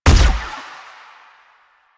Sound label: Shot Fire, Weapons; Warframe; Acceltra Prime